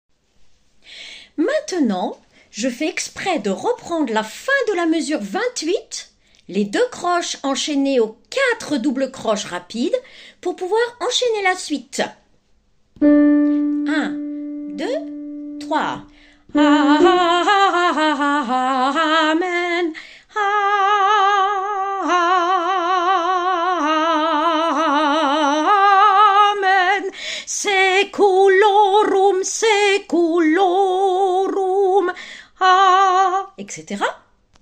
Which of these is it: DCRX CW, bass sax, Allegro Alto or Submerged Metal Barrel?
Allegro Alto